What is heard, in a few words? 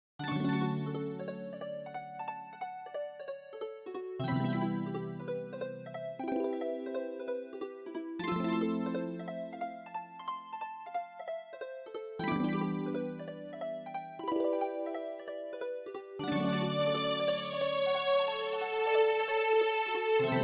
Midi Scene; Shining Plume 2 Windows gamerip 2017